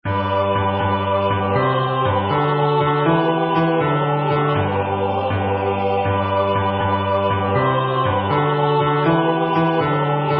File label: Bass